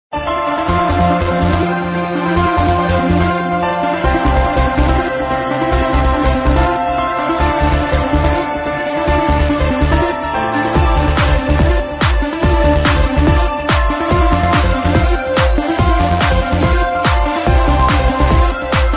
Techno Trance Ringtone

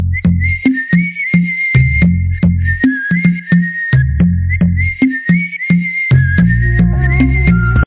Whistle BGM, Telugu Ringtone

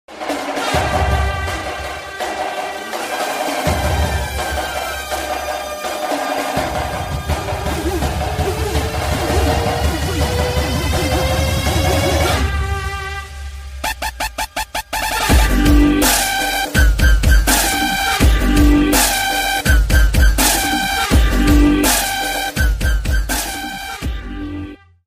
Bgm Tamil Ringtone; Theri Movie

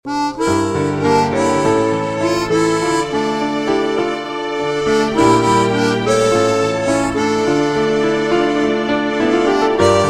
Sad Melody Ringtone

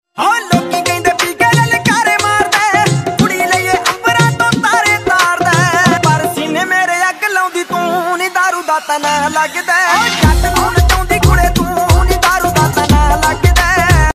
Dance Punjabi Ringtone

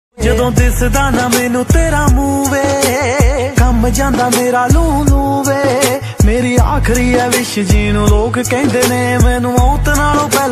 Popular Punjabi Ringtone

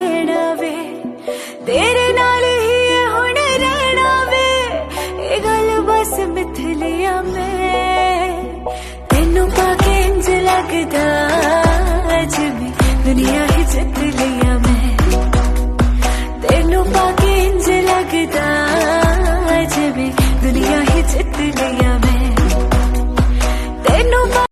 DJ Punjabi Ringtone

